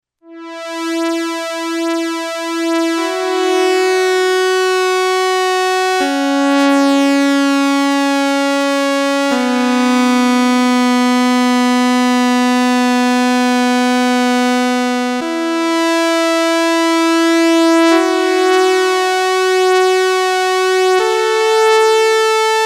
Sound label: korg trident; blade runner